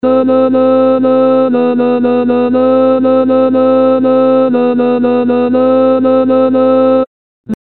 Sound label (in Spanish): introducción tenor